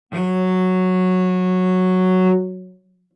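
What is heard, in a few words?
Cello arco fortissimo sul D Gb3; MIS Pitches - 2014; Strings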